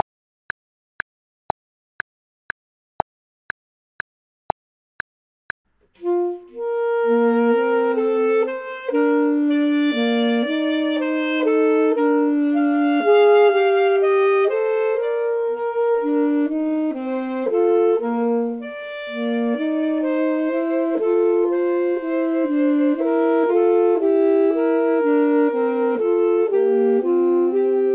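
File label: both, Saxophone and Clarinet; voxman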